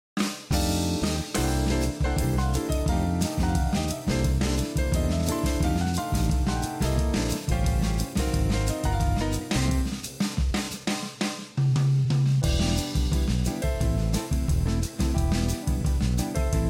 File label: backing track